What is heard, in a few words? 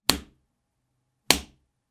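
hit sound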